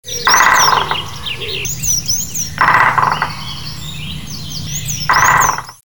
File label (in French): pic vert, chant